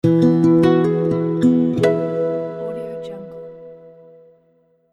acoustic guitar logo

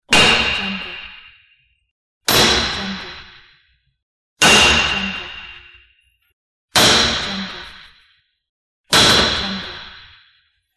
hammer on the anvil

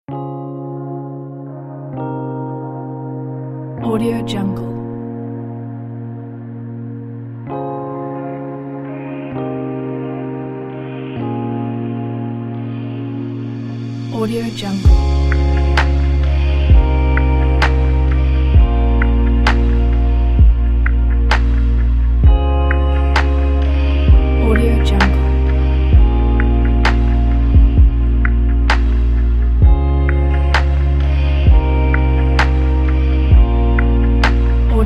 dreamy meditative electronic pack

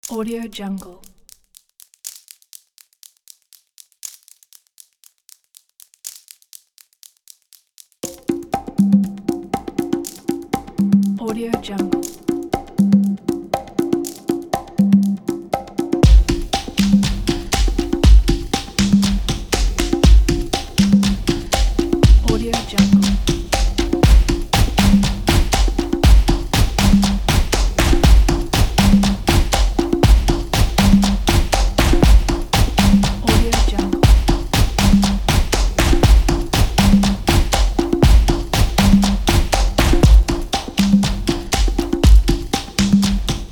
african folk percussion

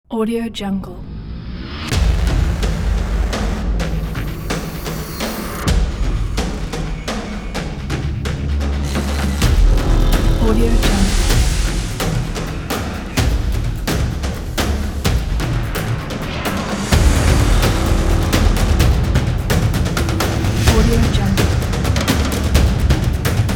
armageddon drums

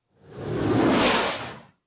whoosh bys passing swooshes crackling rumbling; prosoundfx

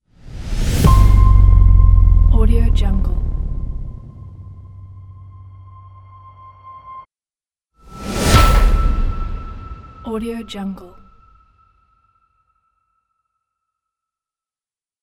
trailer piano hits

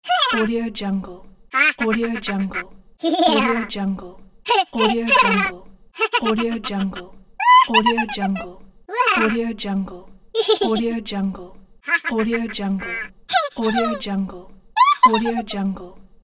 cartoon laugh

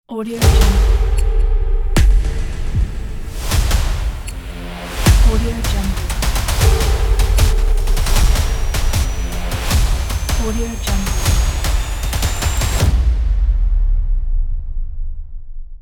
action drums teaser

